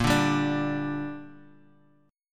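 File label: A sharp 5th chord, Guitar, Open G tuning; down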